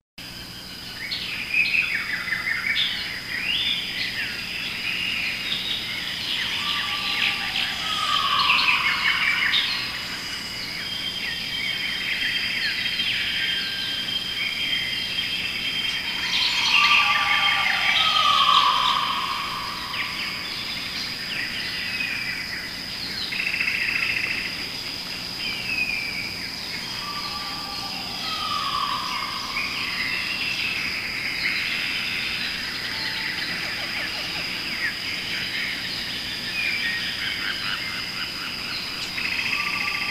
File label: jungle sounds; forest sounds